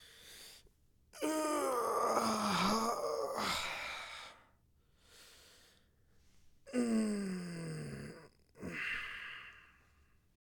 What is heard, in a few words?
Stretching Sounds